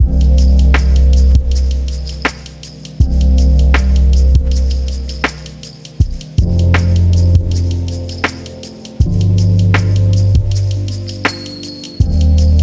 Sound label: Instrumental